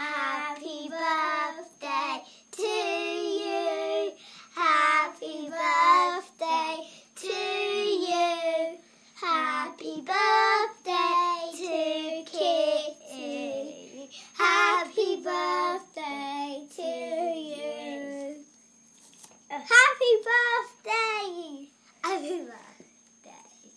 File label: happy birthday kitty